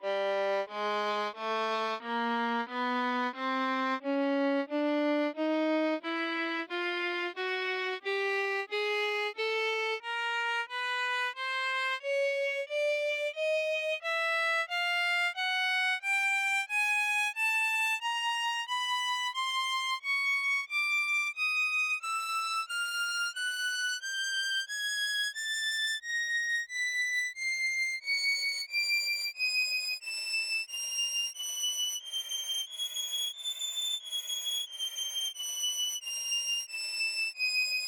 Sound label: notes on the violin